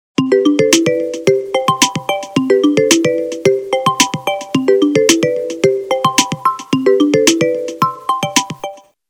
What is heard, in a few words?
bubble